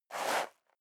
step leather shoes gravel light slide short; schritte

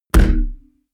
heavy saturated damped, hits impacts; whooshes rises

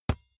basketball single hand catch hard dull

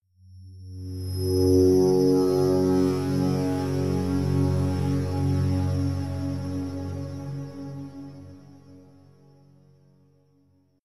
scrapes ringing blitter harmonic; musikelemente; drones

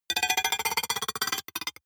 rattle metal can hollow; bewegung

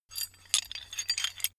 metal handling pieces

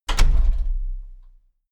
closing door

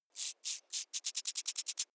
creature movement tiny; bewegung; comedy-cartoon; soundpool

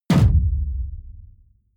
sci-fi shot tonal sub noise dull; schusswaffen

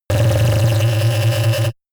shot ringing wobble noise; pulsieren

